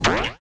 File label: balloon bounce; coffee crisis arcade edition